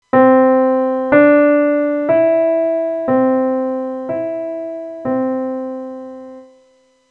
Melodic Dictation Pitches Only

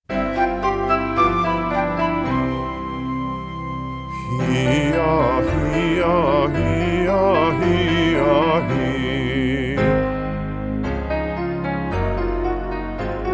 Hee Ah Hee Ah

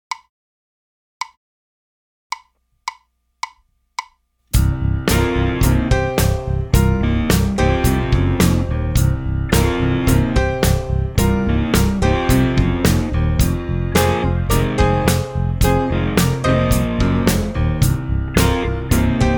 Blues Based Minor

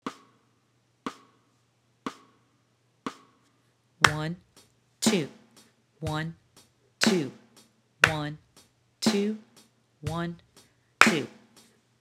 Quarter Rests; Traditional

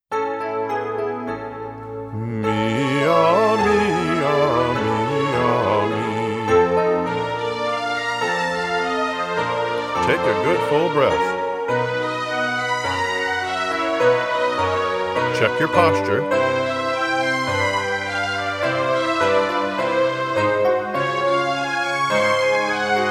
Mee Yah Mee Yah

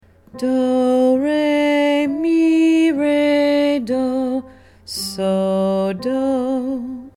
Centering Song

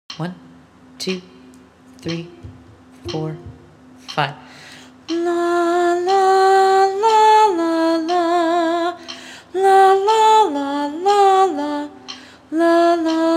Melodic Dictation

